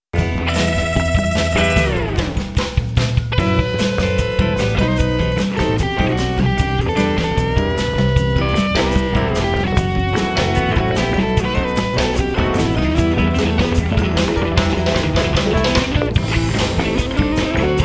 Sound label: Plays Electric Guitar